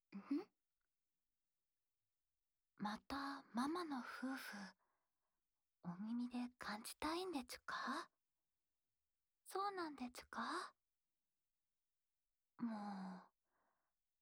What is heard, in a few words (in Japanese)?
安眠用お耳ふーふーループ（左耳, ASMR